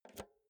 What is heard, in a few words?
insert jack multi socket quick